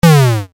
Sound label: Jump on monster Arcade; Doodle Jump; 3DS, Android, Arcade, DS, iOS, Mobile, Xbox 360